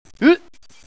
startle, hostage reactions

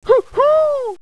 whoo; radio bot

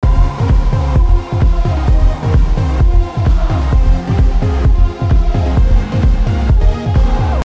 music; cstrike; zp town

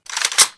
vintorez bolt

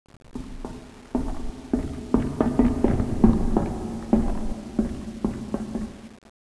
strange

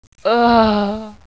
hostage pain; cstrike

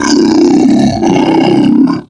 zombi coming; cstrike

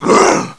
pain; cstrike; impulse

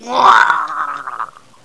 death; cstrike; impulse

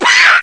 pain; invis

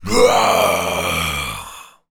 zm scream; cstrike; biohazard

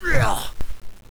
miss; cstrike

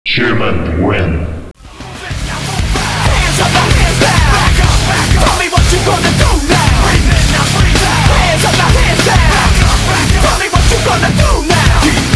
human win; cstrike

